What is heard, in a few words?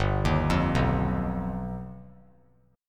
G Augmented 7th; G+7 arpeggiated; 7,10,8,9; JGuitar